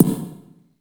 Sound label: SPX90 KICK, effected drum; Crypton - DrumBase5000